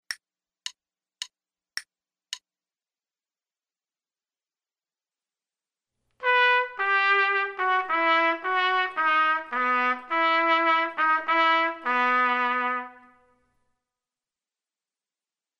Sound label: trumpet; Selected Duets for Trumpet Podcast